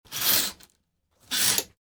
curtains opening

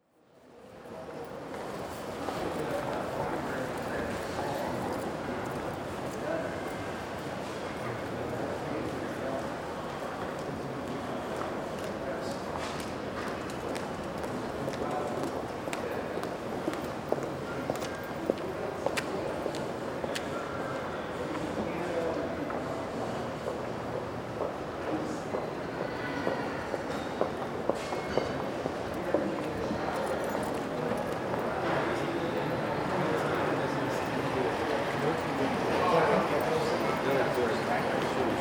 hotel lobby large in usa

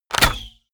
bow shot

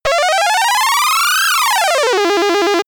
arcade racer

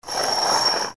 scratch

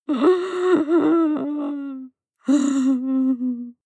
breath female whimper breath

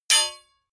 block metal small

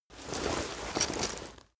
leather metal handling short

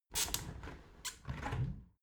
window open soft sequence

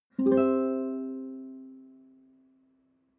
nylon guitar chord high major soft

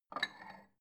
bowl take soft

